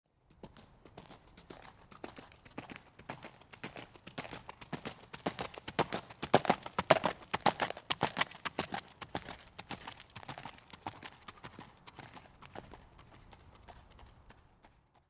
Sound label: horse gallop dirt